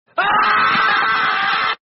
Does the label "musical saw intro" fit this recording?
No